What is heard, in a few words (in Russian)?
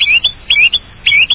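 обеспокоенный свист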